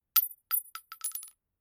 Bullet 243 Rifle Brass Shell Case Dropped On Concrete Bounces Metallic Ring; Rolls Slightly To Left; Miscellaneous Combat; Tom Hutchings